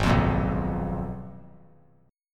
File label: G sharp Minor 6th Add 9th; G#m6add9 strummed; 8,12,13,9; JGuitar